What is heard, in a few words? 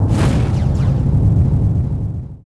ROCK